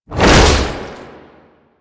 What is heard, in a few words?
Proto Infested Babau Underground Attack Swing, Enemies